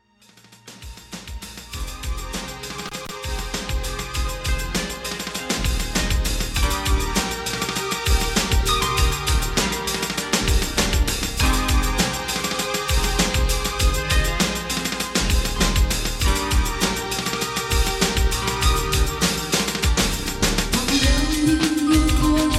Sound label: Rainstorm (Short Intro Vocal Version)